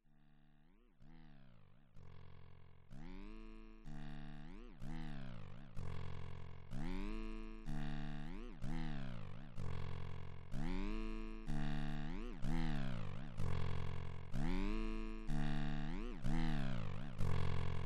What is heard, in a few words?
Metal in Time Revival of Buzz for wOnKo - experimenting with tweaking machines in real time